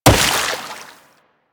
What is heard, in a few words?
Wall Jump Water; Footsteps; Warframe; Tenno; Wall Run